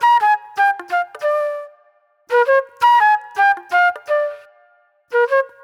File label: Wild Flute